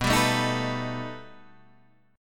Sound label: B Augmented 7th B down Guitar Half Step Down